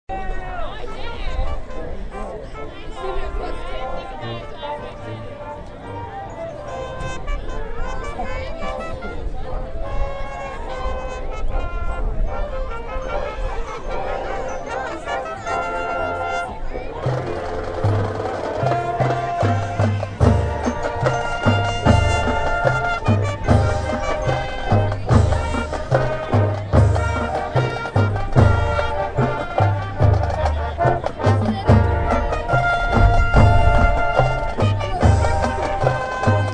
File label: drumline